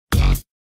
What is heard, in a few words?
bwomp; blast processing